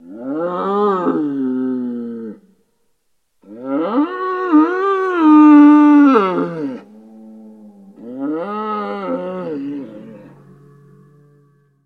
Cattle, Cow Bellow. Multiple Bellows With Bull Bellows In Background. Close Perspective.